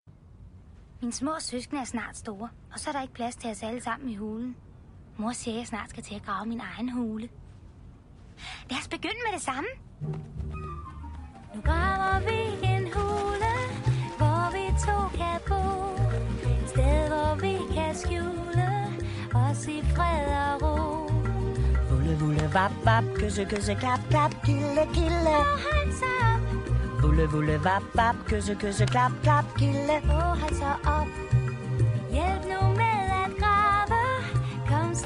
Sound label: Jungledyret - (Original Danish); Mega Jungledyret Collection